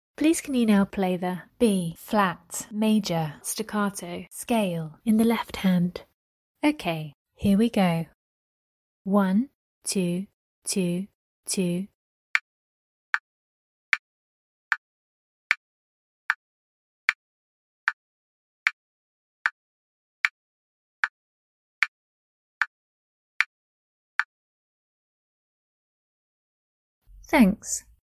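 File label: B flat major staccato scale left hand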